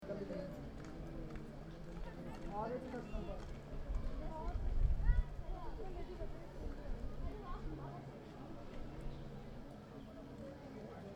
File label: amb, Inde; tour du monde 2014